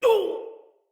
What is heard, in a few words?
Pain, Soldier Male; Dendrit